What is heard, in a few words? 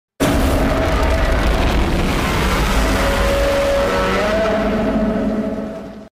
godzilla roar; godzilla minus one